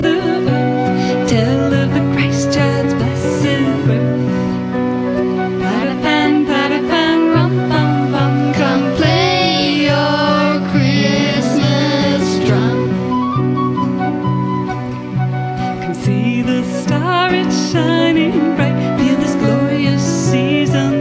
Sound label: One Drum